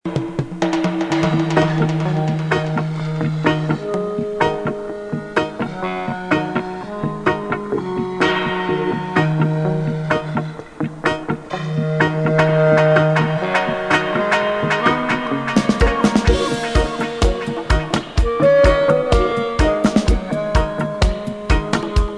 sax

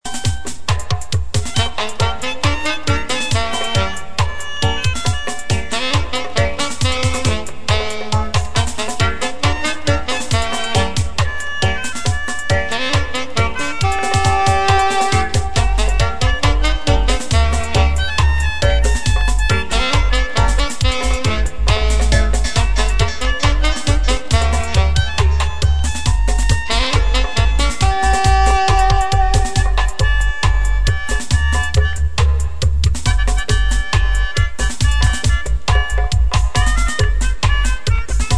horns

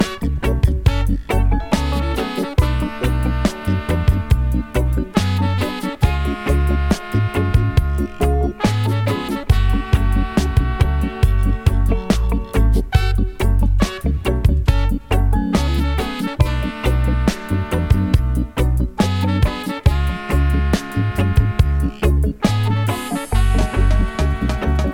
blow horns

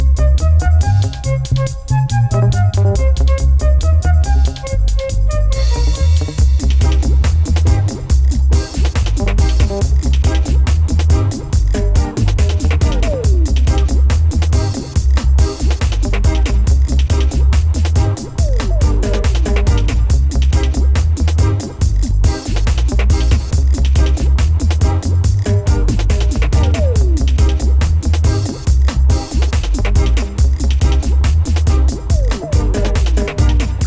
flute

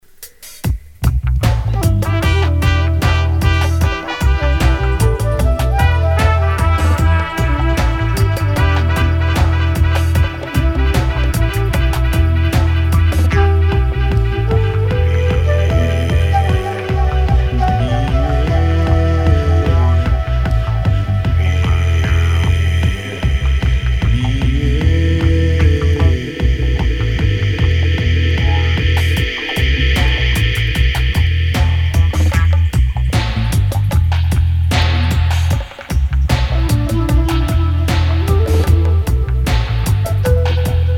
ancient flute mix

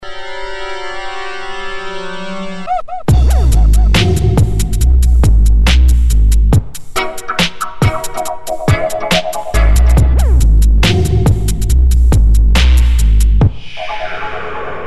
dubstep

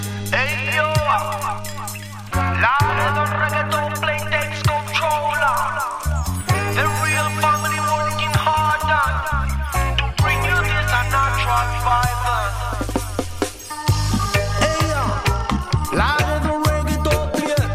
reggae dubplates

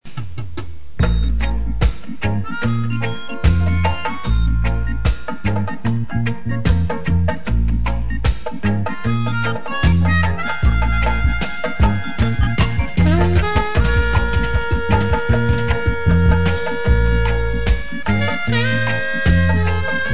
drums

